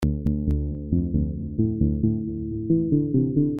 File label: loop baseline